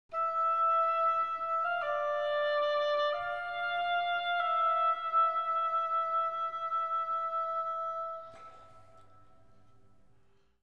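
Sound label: theme melody; Myst III Exile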